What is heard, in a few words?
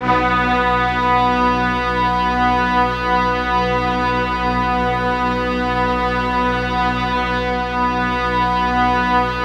Band Orchestra B4, brass; Giga Samples Collection